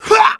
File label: death; mohaa; dialogue